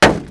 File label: car; Mafie 1